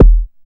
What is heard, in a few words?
BD ANALOG; Sound & Vision - Gigapack II CD 2